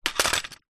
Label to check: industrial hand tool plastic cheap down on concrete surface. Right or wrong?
Right